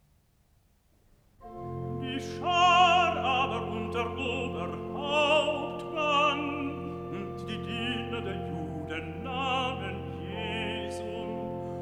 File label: Recitative; Bach Johannes-Passion BWV 245; Karl Richter (conductor); (Evangelist) Die Schar aber